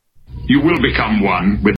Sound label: huawei fantastic ringtone